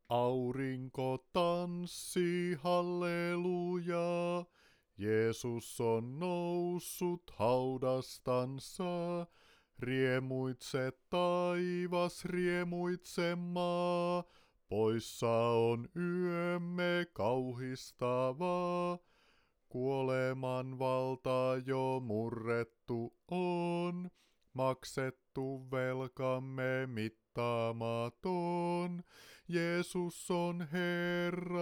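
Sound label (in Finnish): Virsi Stemmanauha Basso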